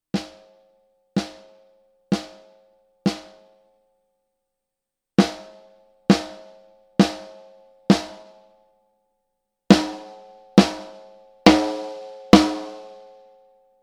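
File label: Gretsch Black Alu low, snare; Anniversery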